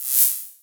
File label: gambler rotate card; Magic Stars 5; Wazdan